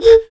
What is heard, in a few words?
Human Perc